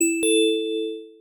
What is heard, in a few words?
Ding; catchfruit